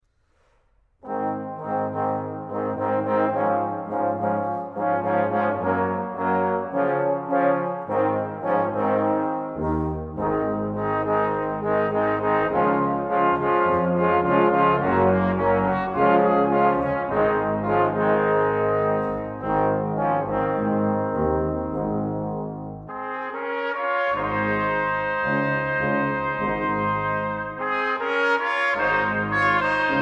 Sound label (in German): Glocken der Weihnacht